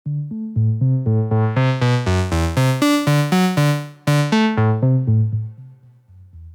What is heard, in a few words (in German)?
Klingelton, modular synthesizer; Superbooth22